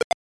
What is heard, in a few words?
Kick; SMBDX